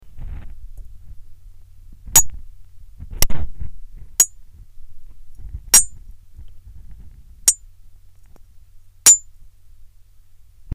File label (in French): Crevette claqueuse